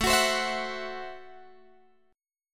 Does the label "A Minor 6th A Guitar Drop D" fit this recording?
Yes